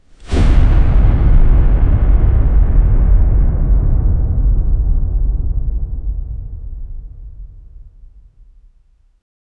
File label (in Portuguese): o som de um impacto